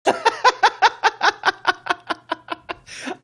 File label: awesome laugh